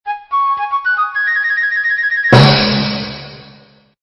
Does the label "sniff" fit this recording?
No